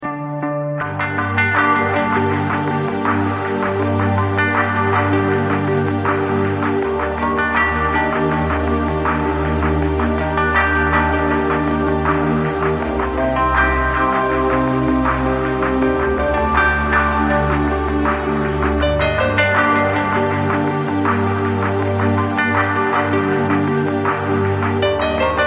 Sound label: Instrumental